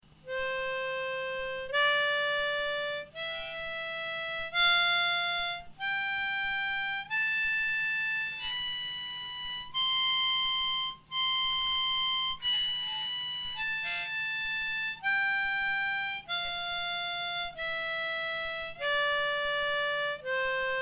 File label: harmonica sample